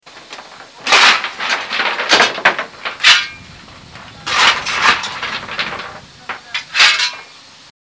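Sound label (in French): Pelletées de charbon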